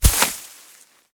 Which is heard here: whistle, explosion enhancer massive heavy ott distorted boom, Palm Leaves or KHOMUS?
Palm Leaves